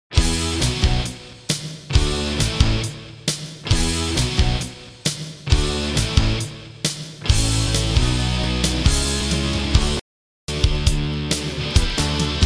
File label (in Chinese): 片头音乐